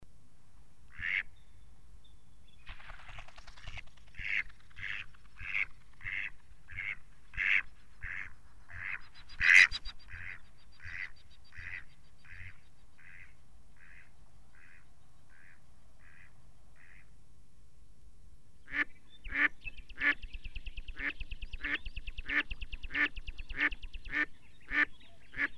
Canard Col Vert, oiseaux; Sons Oiseaux

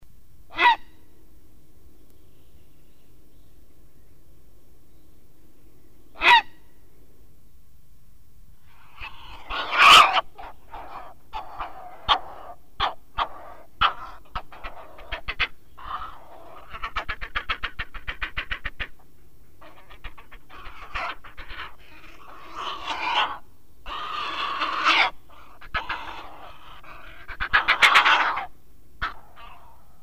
Heron Cendre; Sons Oiseaux

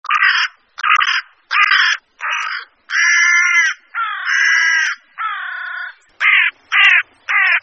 Corneille, Oiseaux; Sons Oiseaux

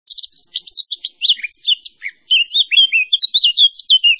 Fauvette; Sons Oiseaux

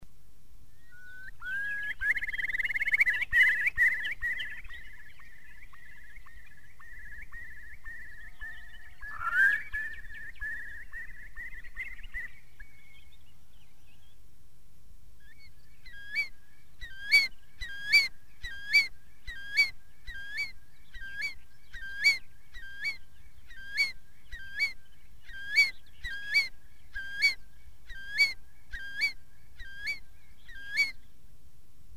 Courlis, oiseaux; Sons Oiseaux

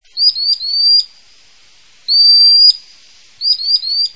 chevalier guinette, Oiseaux; Sons Oiseaux

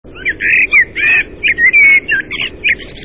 Merle, Oiseaux; Sons Oiseaux

